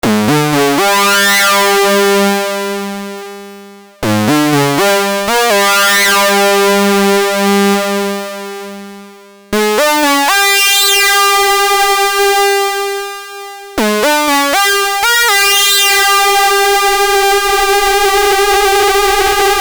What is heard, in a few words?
hard sync anim; tellun